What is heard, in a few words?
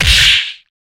punch